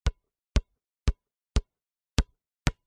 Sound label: basket ball bounce soft x6 on asphalt concrete; sport